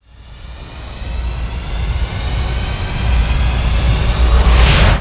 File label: warpstart; Earth and Beyond; EA Games